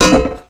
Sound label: weapon drop heavy